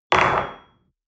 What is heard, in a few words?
espresso place on table hard